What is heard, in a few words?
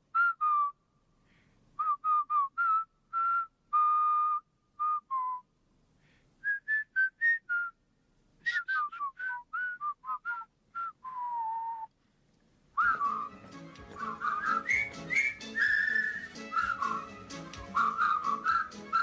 Whist